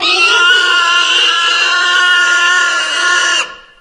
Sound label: boar anomaly; monsters